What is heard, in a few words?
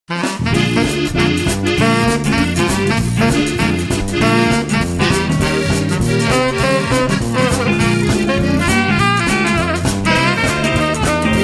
bayou swing